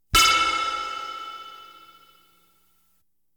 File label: Science Fiction effects, Lasers, Light beams